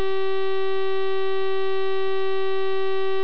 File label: noise; 400Hz